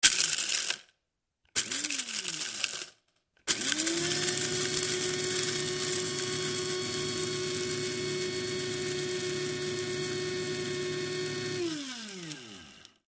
Coffee Grinder 3; Coffee Beans Being Ground; Begins With Two Short Grinds Into A Long Steady Grind. Medium Close Perspective. Cafe, Restaurant.